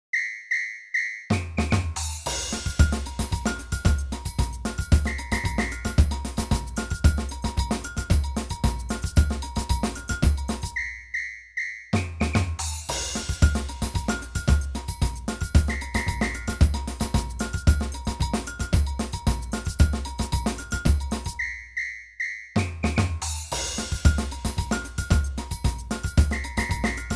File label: samba korg